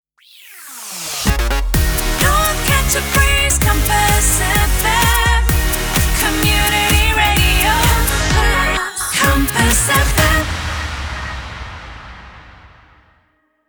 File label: Sung Jingles Eb minor 128bpm Slogan; Compass FM